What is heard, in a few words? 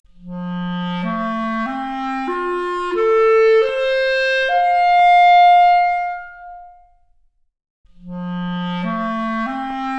Clarinet arp, Stereo output noised; 4bit